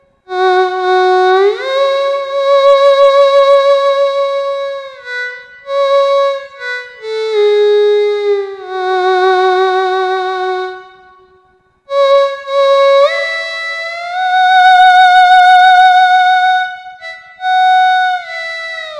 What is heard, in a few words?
violin